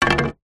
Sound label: wood pine plank short thin 40mm x 10mm set down wood decking; foley